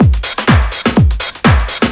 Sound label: DRM 125, House; Best Service ProSamples vol.10 - House; AKAI